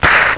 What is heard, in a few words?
SLAP